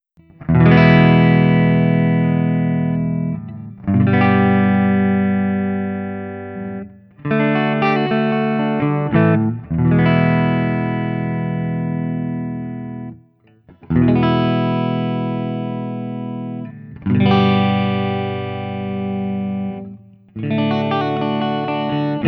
Guild Burnside Crossbow ODS100 Open, Clean, Open Chords; Burnside (by Guild) Crossbow; ODS100 Clean – BK